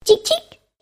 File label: click; monstergo; pokebot